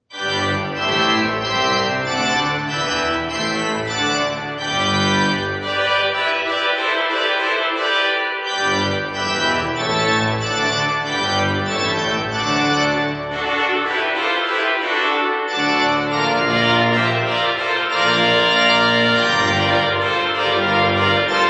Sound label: Fanfare in G